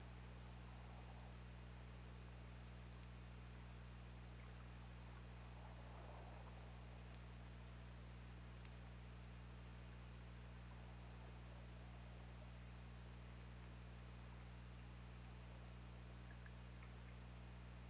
Orca; OrcaLab

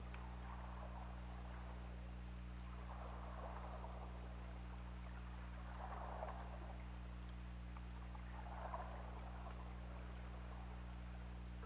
Orca; OrcaLab

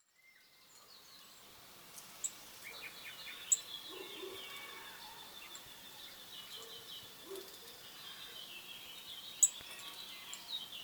Sitta europaea; SETE

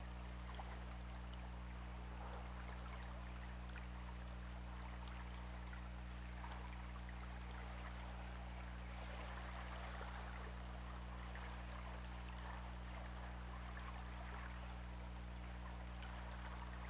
Orca; OrcaLab

